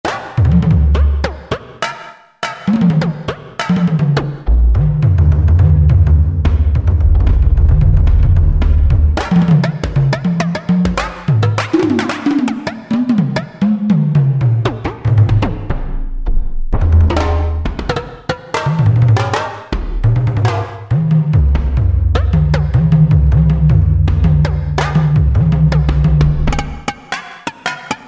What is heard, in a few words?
Tribe Switch, Roland SR-JV80-05 World